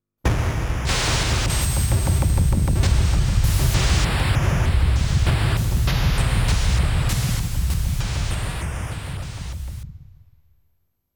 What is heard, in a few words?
Wall Collapsing; Ys II; Music from Ys II